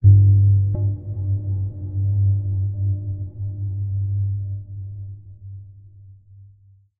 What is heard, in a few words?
editor blips; Source Engine; Portal 2